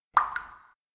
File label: tear drop cheek; anemoi